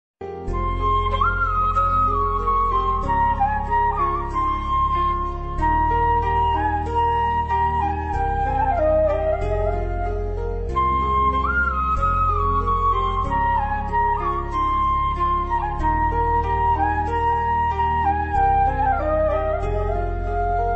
flute music ringtone bansuri ringtone

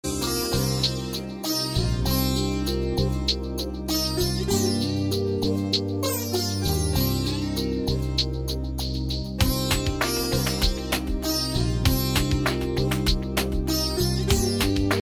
bgm instrumental

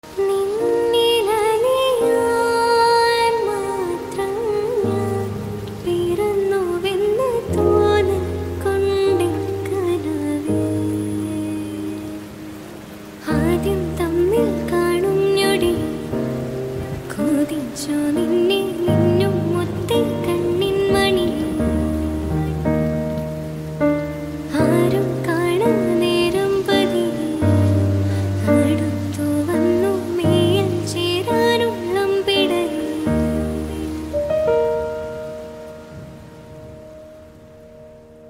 song female cover version; june movie

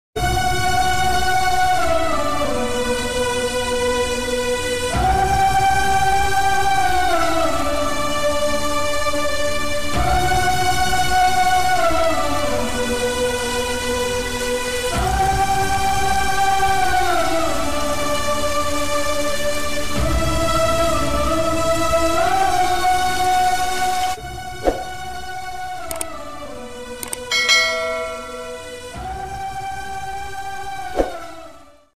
odia movie ringtone, sad romantic ringtone; abhimanyu; anubhav

